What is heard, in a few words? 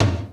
GATED TOM LO, MPC-60 DRUMS; Greytsounds VOL-1